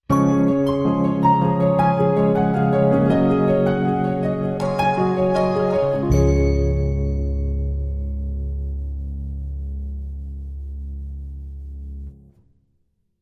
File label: Charging Station, Sting